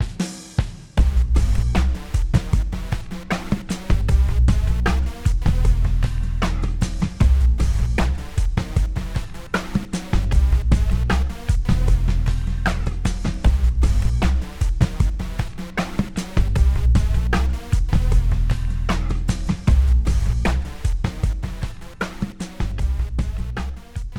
Rap Loop; The Jackbox Party Pack 5; Jackbox Games

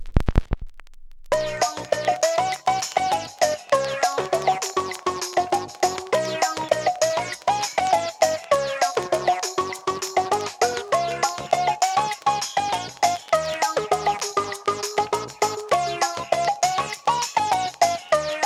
Unused Question Music; Jackbox Games; The Jackbox Party Pack 3

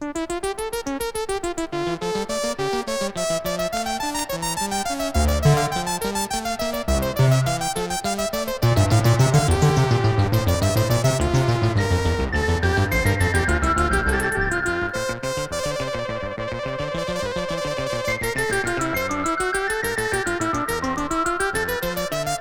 Two-Part Invention in D Minor

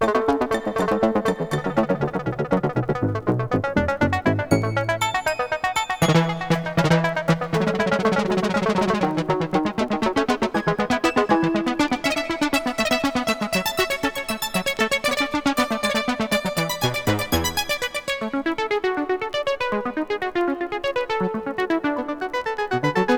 Two Part Invention in A Major

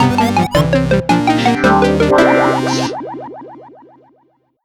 Logo Music; Earwax; Jackbox Games; The Jackbox Party Pack 2

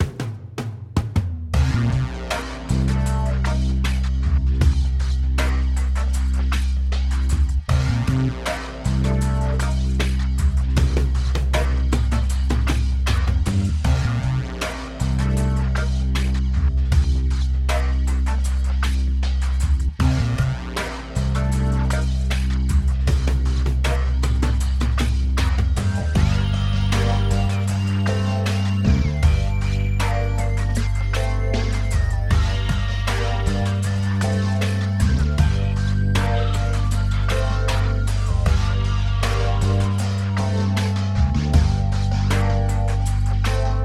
Write Loop; The Jackbox Party Pack 5; Jackbox Games

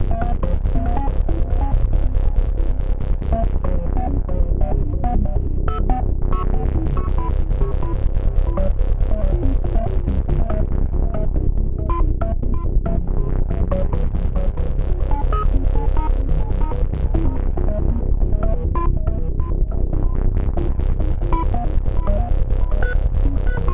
Background Loop; Earwax; Jackbox Games; The Jackbox Party Pack 2

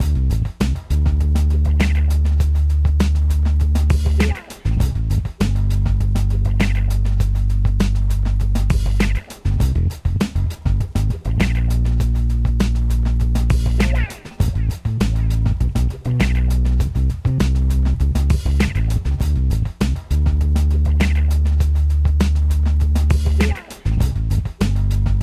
Background Loop; Jackbox Games; The Jackbox Party Pack 1; YDKJ

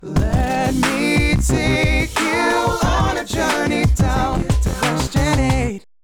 Question Segue; Jackbox Games; The Jackbox Party Pack 1; YDKJ

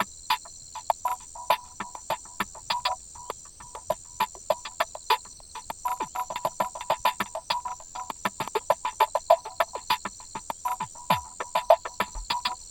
Background Loop

